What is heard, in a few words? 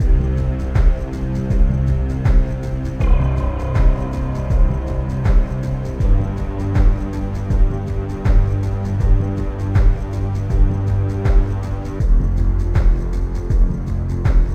Loop; Acquaria